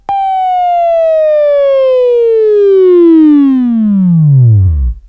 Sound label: Buzz; Labview-Program; Sweeping Training program